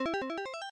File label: Trigger Falling; Pushover